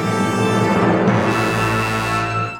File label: Background tone